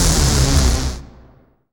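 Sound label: Rave Synth, Dance Mega Jungle Rave; 90 s Sample CDs; Best Service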